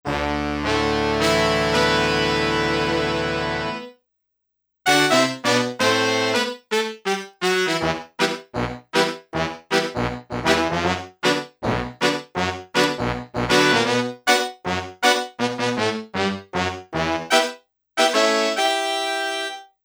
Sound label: Horn Line